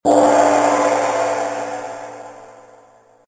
Gong

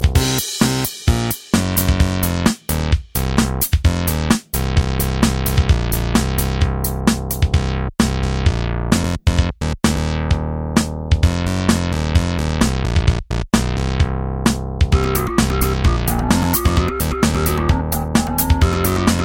Retrorobot

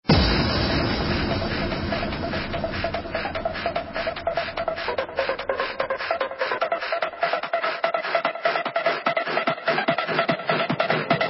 Generation Bass

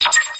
Space Beep

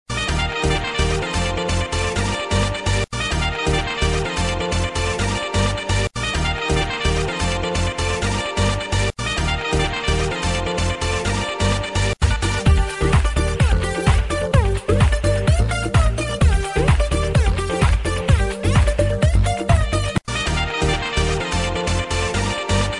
Tone

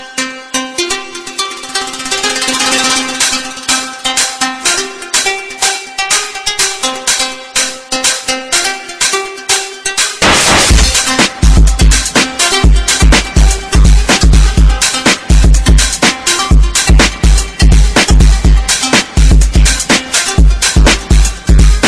Bass Guitar Music

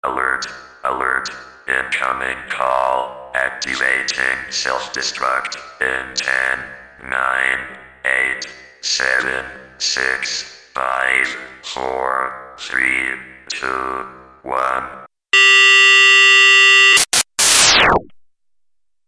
Alert Self-destruct

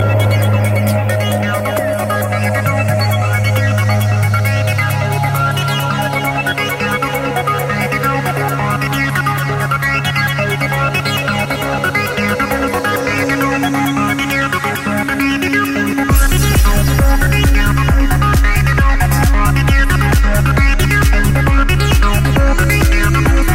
Full Bass

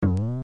Tire Bounce; DKC

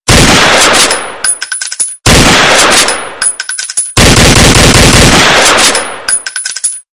9mm Gun Big

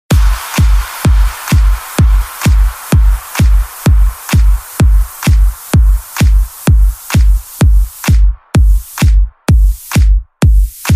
ELECT MIX

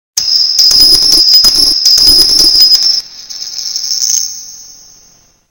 Coin Drop; Agha

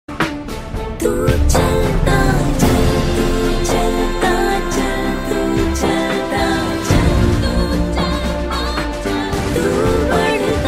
New Army Song

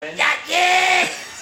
Yaa yeEEETTTT

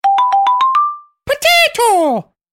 Potato notification

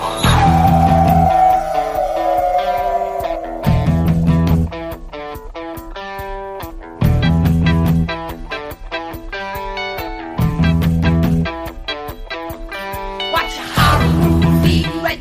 Horror movie

